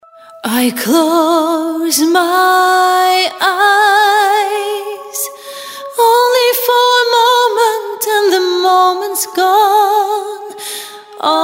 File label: A Cappella